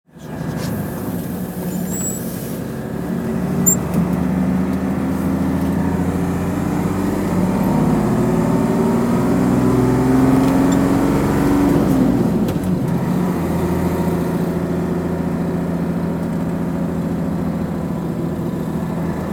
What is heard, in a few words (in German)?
Fahrgeraeusche innen